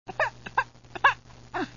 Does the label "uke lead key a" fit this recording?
No